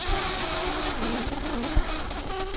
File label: bumblebees, Pascuorum queens